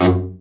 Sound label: BRA Bari, SAX; Best Service Gigapack 1; BR SAX 2